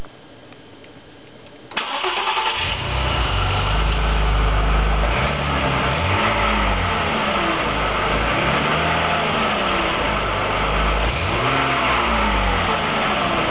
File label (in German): Auspuff leise